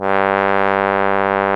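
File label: brass trombone; Roland L-CDX-03 Disk 2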